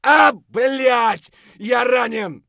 hurt medium; Escape from Tarkov

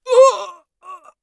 scav death, voice; Escape from Tarkov; scav3

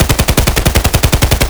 fal outdoor close loop; Escape from Tarkov

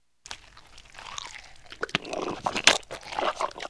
dog eating

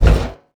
impact metal light

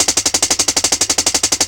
Aircrafts Mi-8MTV2 Spark Plugs; DCS

